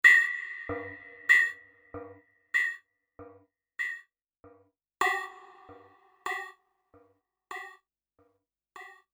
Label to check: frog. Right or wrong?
Wrong